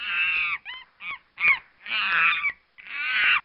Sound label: Monkey Sound Voice